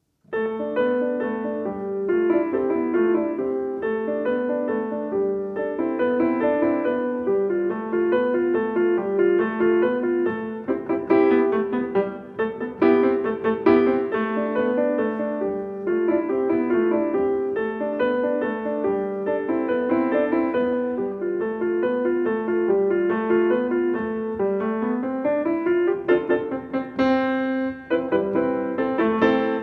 marche, march; satie erik; grand escalier; the grand staircase

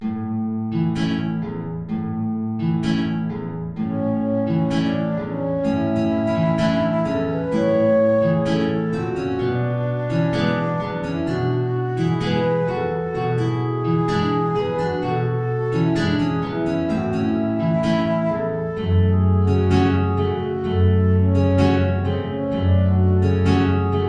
tango; albeniz isaac

